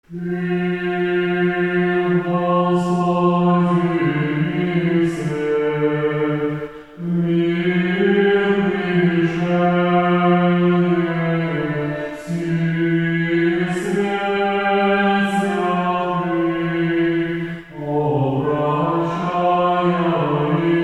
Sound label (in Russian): 4 глас. Ирмос 5 песни; Ты Господи ми свет